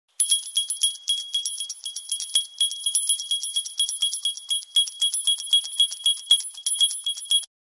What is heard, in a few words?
Jingle Bell